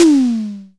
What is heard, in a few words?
Tom Fat Long, Tama TS-206